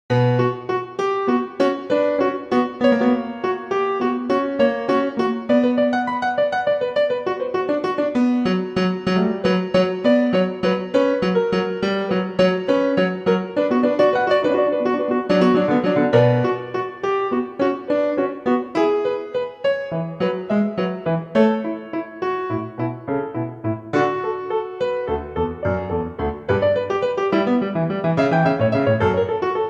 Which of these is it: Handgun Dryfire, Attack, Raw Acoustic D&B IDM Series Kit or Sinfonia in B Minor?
Sinfonia in B Minor